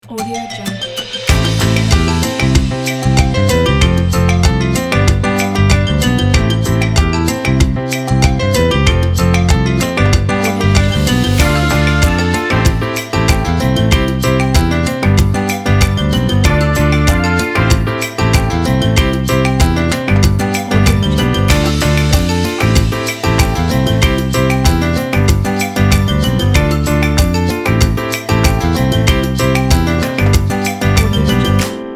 upbeat piano

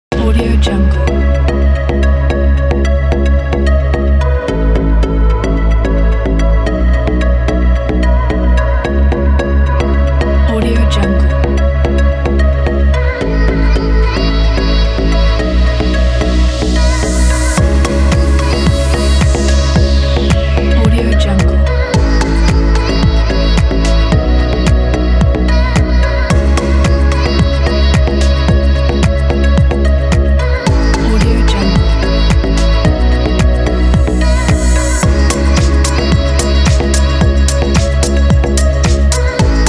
deep house

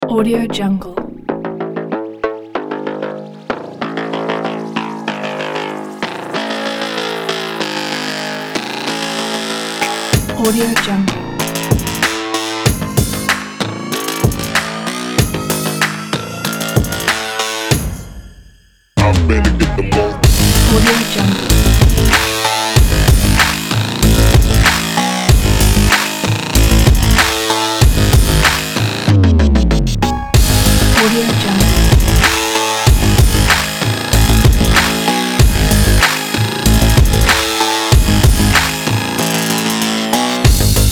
aggressive sport electro dubstep

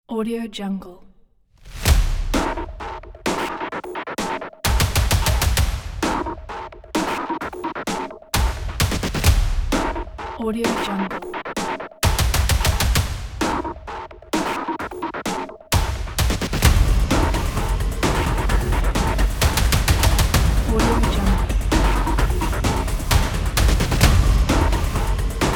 industrial drums

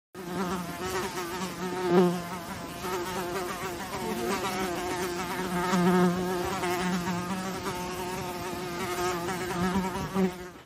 fly

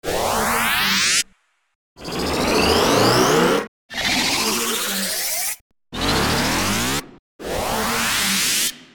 energy charging up

